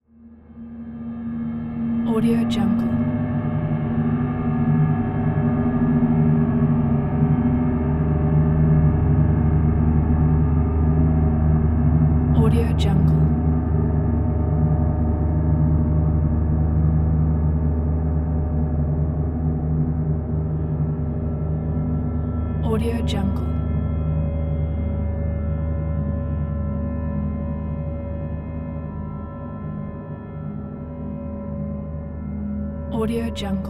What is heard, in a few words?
space soundscape ambience